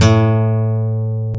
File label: Gtr Acc; CMI